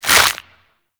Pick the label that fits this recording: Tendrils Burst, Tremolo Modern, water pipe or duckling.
Tendrils Burst